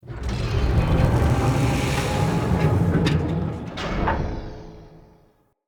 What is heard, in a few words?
Pump Station Barrier Rise; Encounters; Ode Pollution Tower